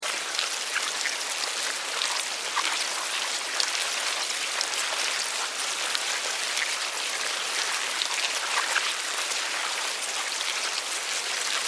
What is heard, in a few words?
Larva Move, Animal, Larva, Mealworms, Grubs, Slimy, Movement, Insects, Bugs; Surround Sound; 5.1; BlastWave